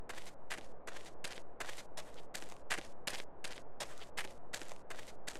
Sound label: Surface gravel